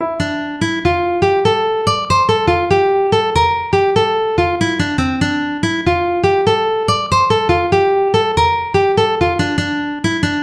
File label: ukulele